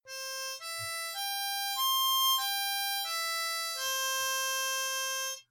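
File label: Arpeggio, harmonica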